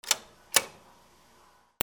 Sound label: MONEY